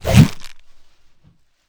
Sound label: Vine Swing